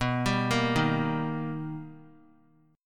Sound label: B Augmented Major 7th B, chord; arpeggiated; JGuitar